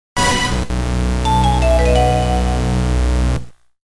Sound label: Jingle Item Get!!; Shining Force Resurrection of the Dark Dragon Original Sound Tracks